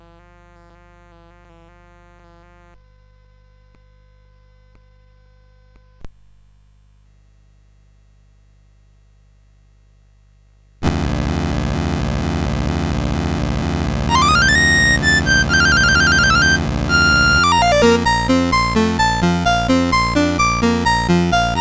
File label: Chinese Dance, CSG8580R5